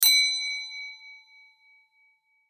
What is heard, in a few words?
Bell Store Door, Bell Store Door Chime Ring Ding; Blastwave; Foley